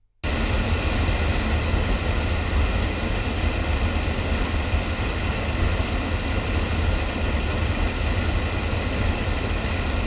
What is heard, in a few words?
ADK A48 omni loudspeakers, microphones recordings